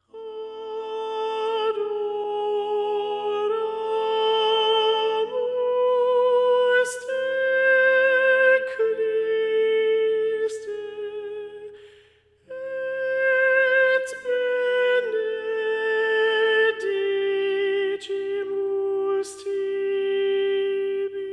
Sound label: Adoramus te S, choir tracks; Palestrina